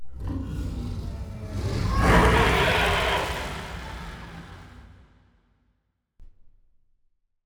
aquatics dragon death

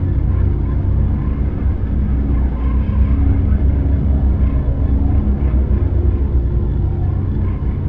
dreadnaught idle; Stellaris; guardians

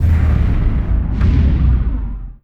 shroud creature death; Stellaris; utopia

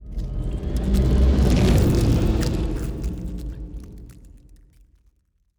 ship titan move; Stellaris; biogenesis

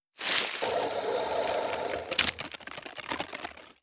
swarm civilian death; Stellaris; other entities

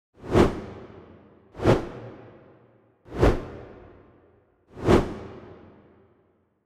kaiju juvenile section idle; ships; biogenesis

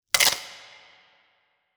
small legs up down; biogenesis

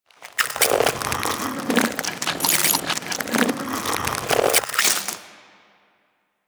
expand vertical; biogenesis

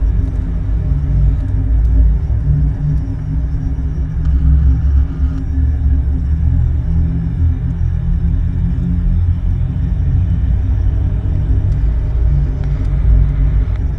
dyson sphere bass hum; Stellaris; utopia

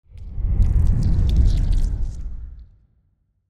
big legs swoosh down; biogenesis

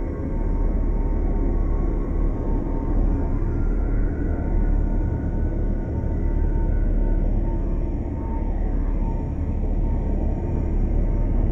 ship habitat idle; Stellaris; shroud; ships

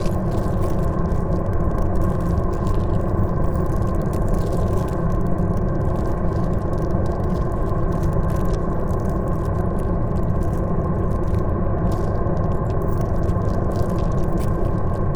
pro ship mauler idle; biogenesis

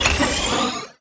queuing building; Stellaris

